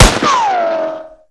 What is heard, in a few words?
gun; metal bullets; weapons